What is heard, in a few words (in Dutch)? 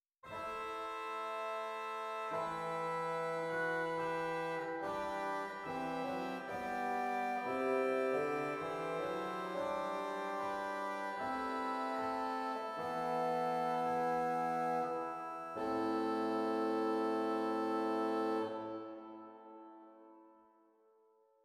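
Hoofdwerk Vox humana 8; zwolle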